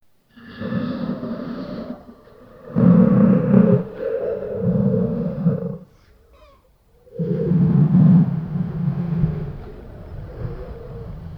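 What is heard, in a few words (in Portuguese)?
como se estivéssemos num b, bunker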